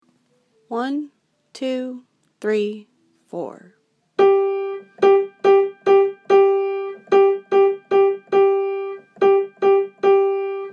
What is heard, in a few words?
Rhythm